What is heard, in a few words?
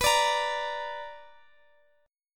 B Minor Major 7th, Guitar, Drop D